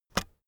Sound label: keyboard external space release, computer